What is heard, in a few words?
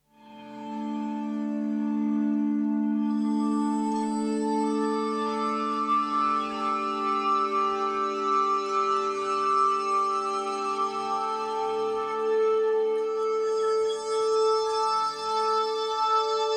iridium morpheus dry